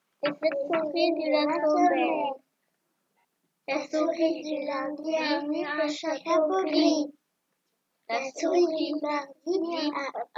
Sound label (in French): comptine souris